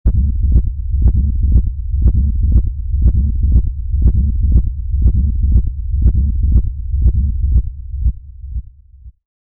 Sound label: strong rhythmic heartbeat; pulse